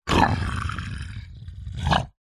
horror monster growl into short snappy snarl

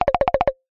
cartoon wobble flutter wood mallet style

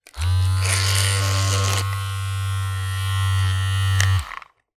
household massager electronic vibration short; zapsplat

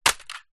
impacts plastic vhs cassette tape

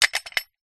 household padlock metal set down concrete ground

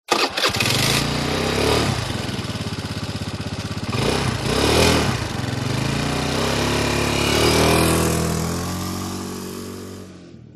motorbike start drive off; the sound pack tree

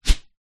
sport tennis racket swing whoosh; zapsplat

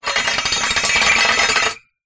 household rake metal drag concrete

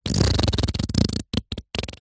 human fart; zapsplat

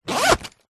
office pencil case zipper

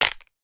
horror bone break snap; sound effects one

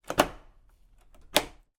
household door lock latch click; zapsplat

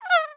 cartoon fly mosquito pass by fast

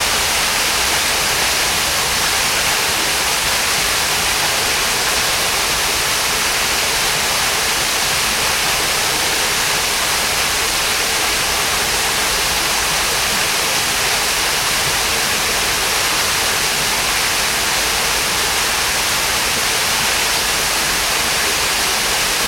waterfall small; sound effects one

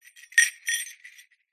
small bells costume jester short movement jingle

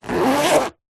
household makeup bag thick zipper

